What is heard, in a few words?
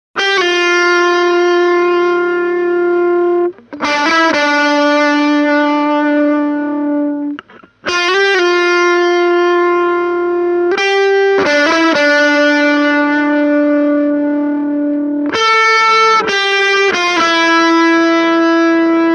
fuzz test